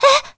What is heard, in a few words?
Gasp; Mila gasping (while being questioned); zelda sounds